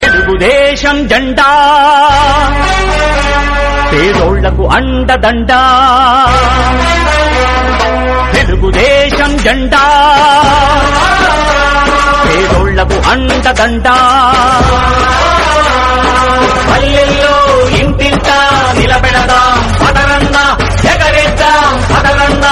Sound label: telugu ringtone